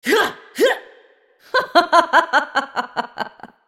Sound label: laugh; Leona